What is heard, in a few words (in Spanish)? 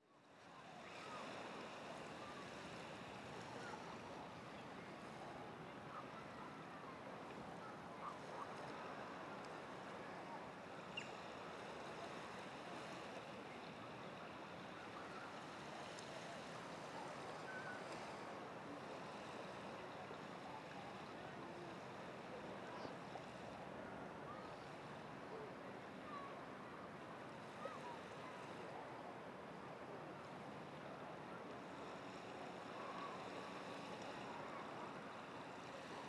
AMB EXT DIA BALNEARIO Pajas Blancas UY PG lejos del mar pajaros voces y transito lejos